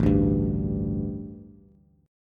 A 7th Suspended 4th A, Bass; strummed

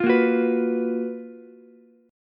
Gb Major 11th, Ukulele C6; strummed; 10,10,14,14; JGuitar

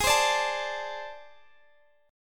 A Minor Major 7th Double Flat 5th Ab

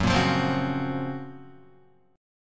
Gb Major Sharp 11th, Guitar; GbM#11 strummed

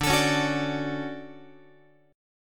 D Major 7th, Guitar; strummed; JGuitar

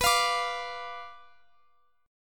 B Diminished B down Guitar Open F; strummed

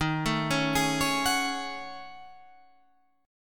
Gb Minor 6th Add 9th Eb

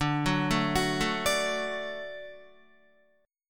D Major D down Guitar; D arpeggiated; JGuitar

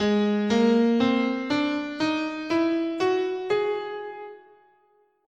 Ab Lydian Minor

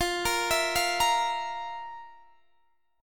F Major 7th Suspended 4th, Guitar, Drop D

